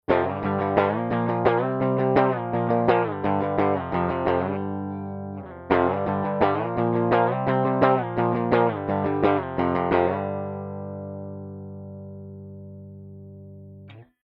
Buffer off backing

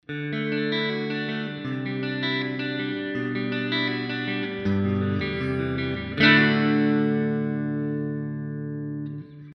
jazz chorus

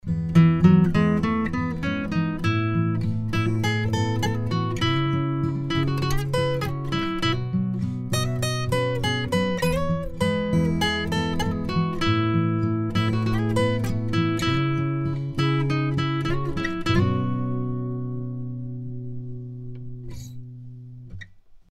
AG solo

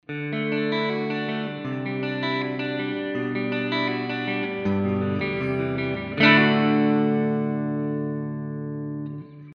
jazz chorus normal

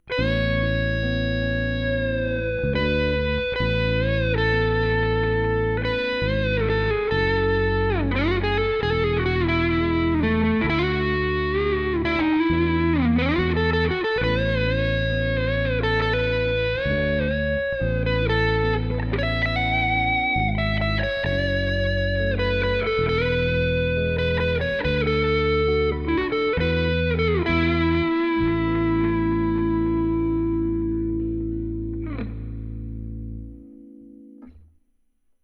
test basic usb Reverb ON

